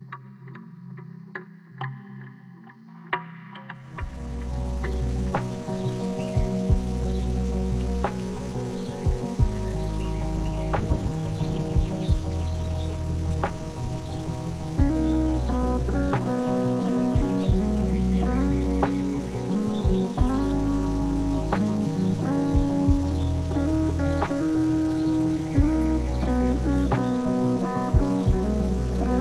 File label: Lofi Chill